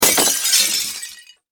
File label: Impact Glass Break Smash; Materials